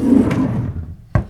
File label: drawer close